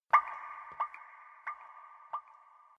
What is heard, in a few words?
sms tone